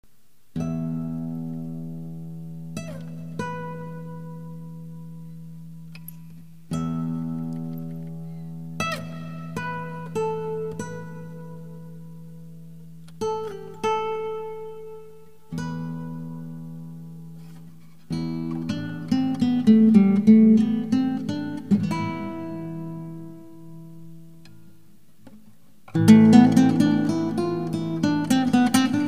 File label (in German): Gitarre Solo